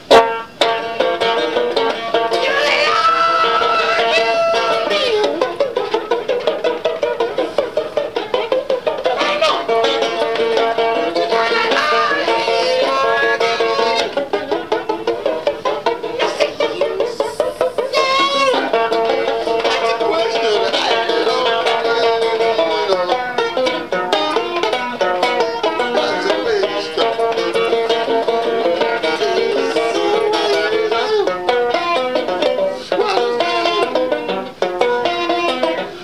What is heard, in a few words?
banjo